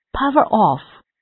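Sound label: power off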